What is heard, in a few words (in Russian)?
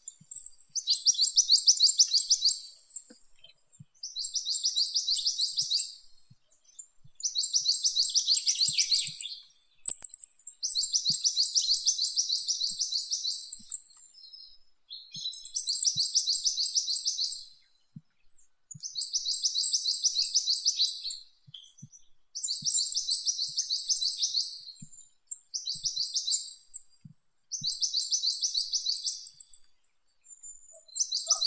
korolek krasnogolovii regulus ignicapillus